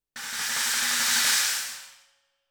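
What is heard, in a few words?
STACK BUILD UP, cymbals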